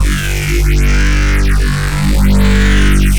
Sound FX Simple Growl Reese

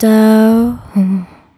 Vocal Doohuh A

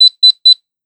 Sound FX Fridge Alert

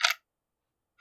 clock ticking; Freelysoundly

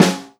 Snare Soft, Death Metal

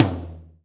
Tom Mid

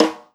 Rim Rim Snare; Millo V3

